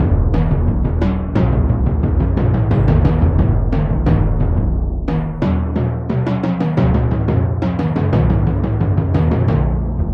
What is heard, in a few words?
Drum